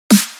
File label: Electronic snare